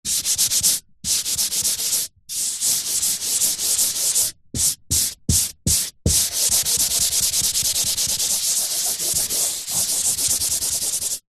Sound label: office eraser blackboard rubs various